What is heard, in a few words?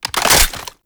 Reload, Weapons; Warframe; Braton Remaster